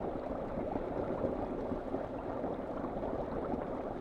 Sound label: pipe; factorio; Factorio 0.14.21